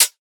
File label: Closed Hihat, Techno; Ghosthack; Free Techno Drum Shots 2024